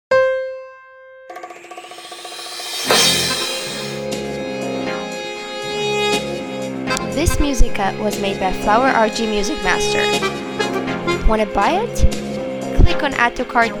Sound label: Tango mix, music rhythmic gymnastics